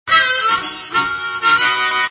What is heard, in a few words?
harmonica, Country Sounds